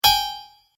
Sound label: Message Error, beep